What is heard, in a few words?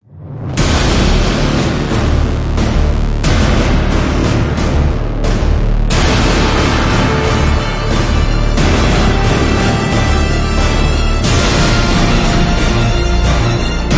No Choir